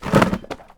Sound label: Book Drop Trash Can; SFX Kit; Foley - Misc